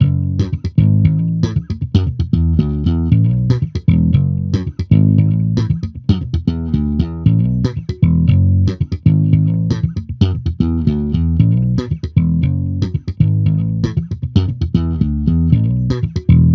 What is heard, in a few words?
Amped loop 116 F#m, Electric Bass Loops; Slate Digital BASSHOLE Sample Pack